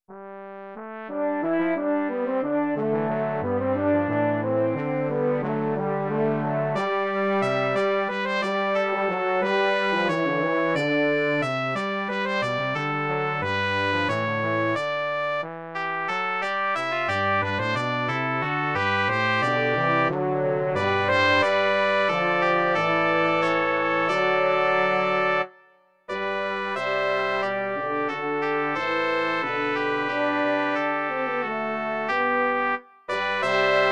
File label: Brass Quintet; Komm, Heiliger Geist